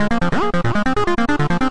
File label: 140 bright white acid line plain; SYNTH AND ELECTRONIC LOOPS